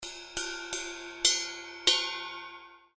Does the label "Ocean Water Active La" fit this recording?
No